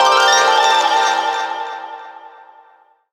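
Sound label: harp TURN FX; Rawcutz - Nu Forms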